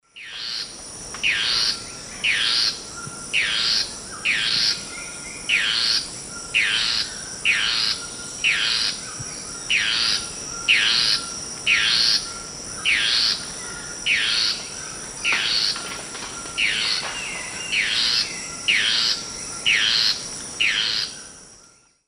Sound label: Thamnomanes ardesiacus, alarm calls, S. America Eastern Lowlands